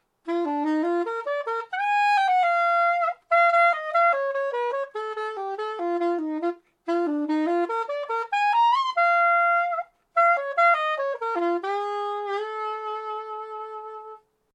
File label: Sop yss62